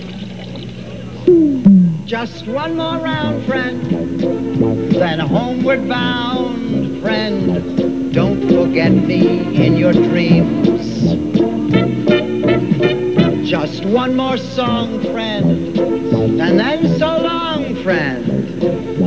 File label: cantina; holiday special